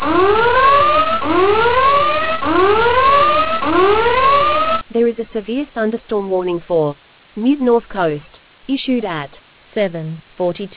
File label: storm audio